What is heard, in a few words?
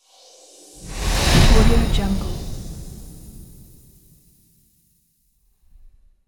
fast whoosh